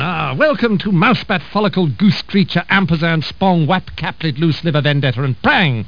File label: string